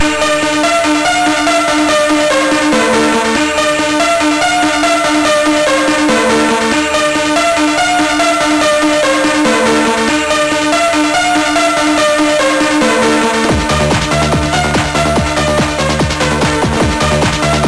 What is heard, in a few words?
Rave Mix, CSG8580R5; Black